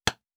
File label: Nail Wood, Crafting; Survival Game Sounds - Lite Edition